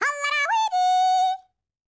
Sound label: YODEL, World Voices; ILIO; Vocal Planet VOL-5; BAVA. YODEL